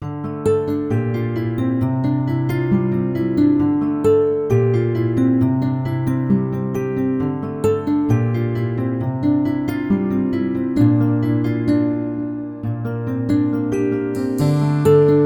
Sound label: Glass Guitar